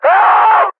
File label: pain; custom monsters; mercs